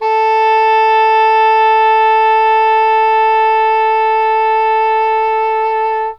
English Horn A3; Standard Instr

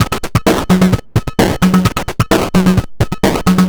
Crushed Beat 130BPM; musicradar

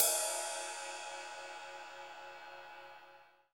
CYM RIDE

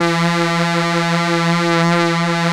3100 SAW E 4, Korg PS3100; Back In Time Records; Korg Universe VOL-1

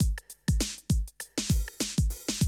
drum loop, 100 BPM

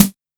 Quasimidi 309, Drums

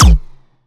blank laser kicka

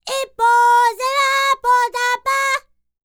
Vocal Planet World Voices, NOMADIC

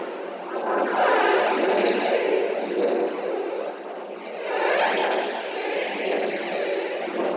Strange Chanting; Zero-G - Future Wave

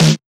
Old Skool House Snare

